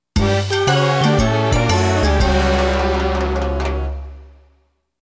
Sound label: Minigame Defeat; mario party 7